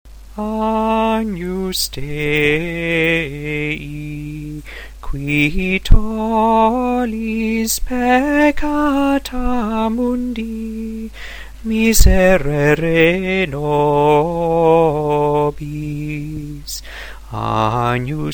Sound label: mass xvii agnus